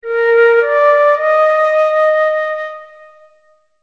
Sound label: Fluffy Flute